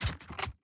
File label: inspect, weapons; cstrike; valorant; singurality phantom